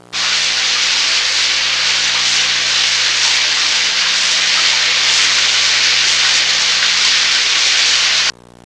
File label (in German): dusche